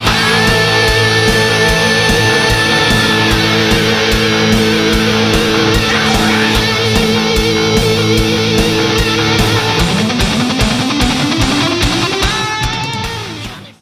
Stinger; DFH Stadium

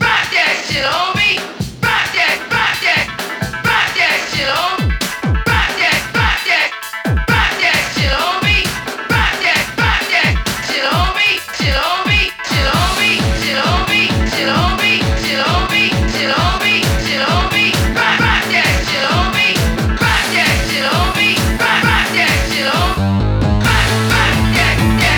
Rock; Jet Grind Radio; Dreamcast

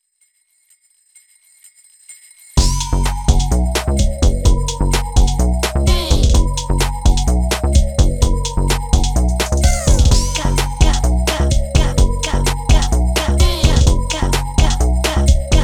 Instrumental Slowed Down, Pause Menu; Friday Night Funkin' T-Mix

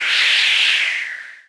Btl Actsrng Spin; The Denpa Men; Android

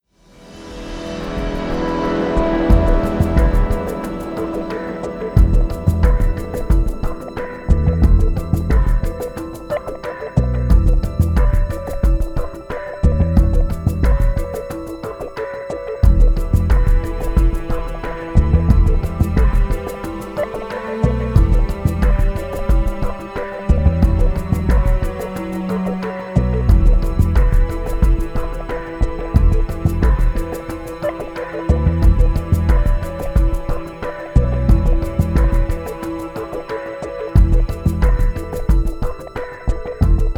Loading Music; frontlines fuel of war gamerip 2008; OXM

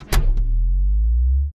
generator item input

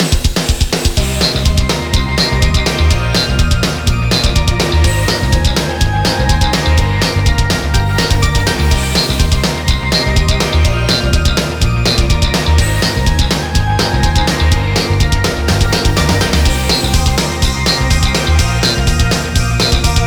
Melanchoric Loop; Super Night Riders linux macos ps4 switch windows xbox one gamerip 2016

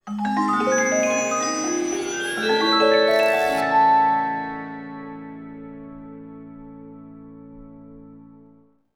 The Selkies Surface Transform; burly men at sea ios macos ps vita ps4 switch windows gamerip 2016

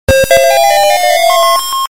Level Finish; New Rally-X; arcade

